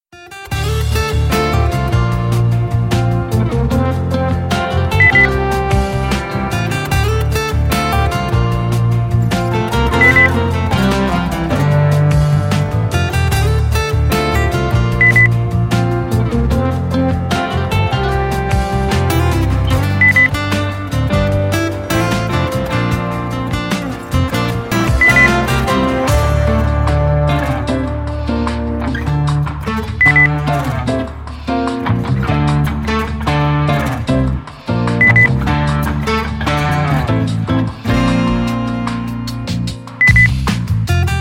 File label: soft